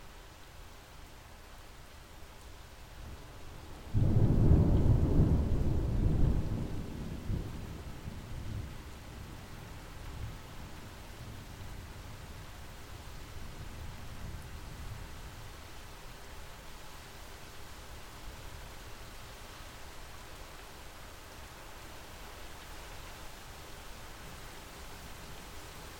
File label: thunder coming; nature